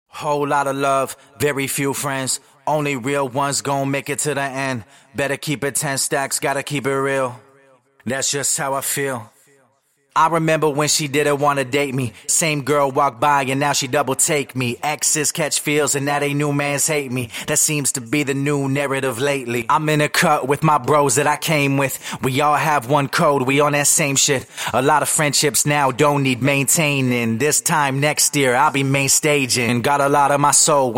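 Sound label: hip hop 105 C sharp minor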